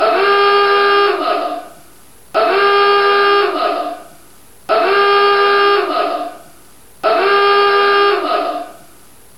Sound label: Alarm